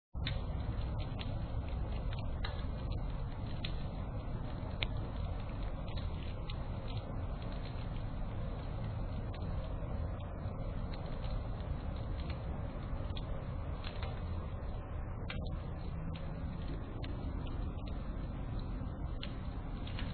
castle fire

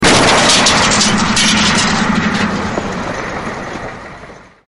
crash; Quicksilver; Drag Race

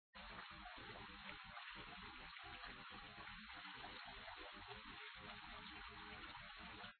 enchanted water

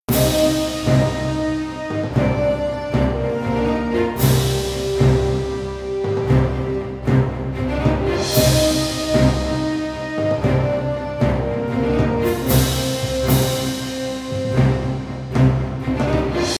Movie Epic Orchestral

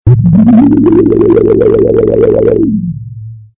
teleport; Quicksilver

